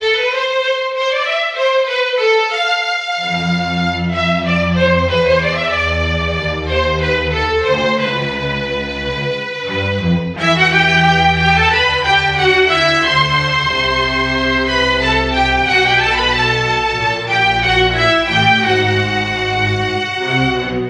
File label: strings, Sad